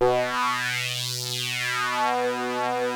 KORG, Rare Synthesizer; Hollywood Edge - Giorgio Moroder Rare Synthesizer Collection

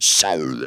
Funky Vocals; USB Soundscan vol.18; AKAI

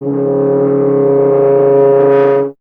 BRS TUBA, Solo Instruments

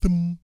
BASS THUM; Spectrasonics; Vocal Planet; Jazz FX

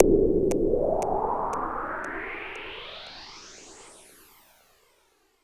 noise fx; Masterbits; Switch FX

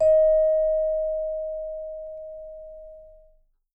D#5, Percussion & Harp; Miroslav Vitous

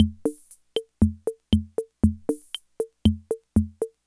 Loop, classic machines, rhythm77

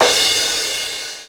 CRSH1, R50III; Crypton - DrumBase5000

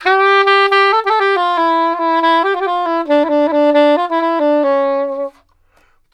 SAX, Balkanish Traditions; USB Soundscan vol.63; AKAI

